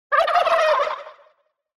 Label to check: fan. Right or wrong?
Wrong